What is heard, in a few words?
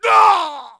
death; dialogue; mohaa